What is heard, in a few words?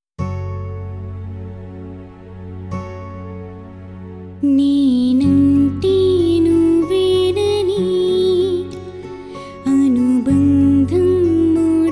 (Female Version)